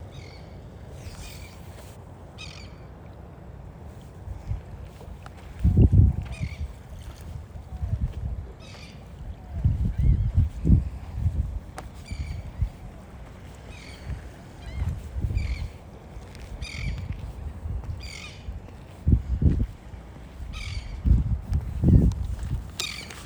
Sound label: royal tern